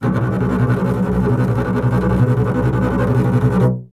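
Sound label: string contrabass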